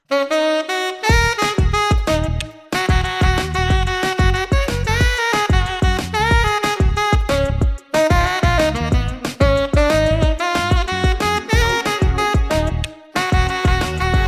sax

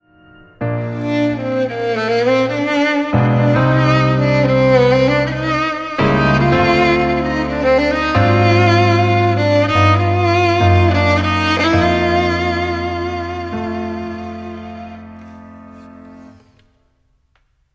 violinist; classical musicians

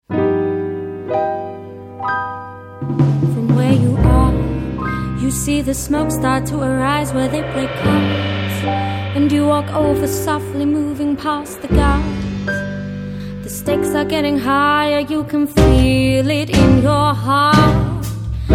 jazz

